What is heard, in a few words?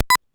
Radio Tuning Beep; SFX